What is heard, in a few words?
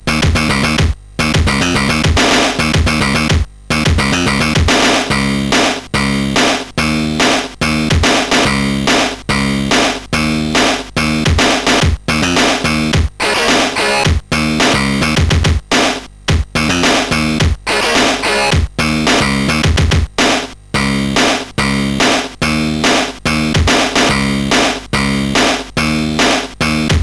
Noise sid CSG8580R5; hvsc